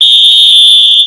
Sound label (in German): Trillerpfeife